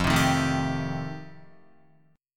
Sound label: F 9th F Guitar, chord; strummed